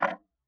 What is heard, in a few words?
Tick, Clocks Kitchen Clock